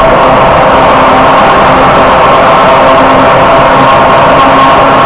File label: 43 km/h; stereo; vehicle